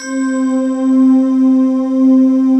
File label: TIME VOX